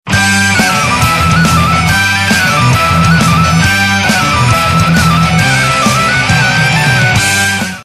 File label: pipe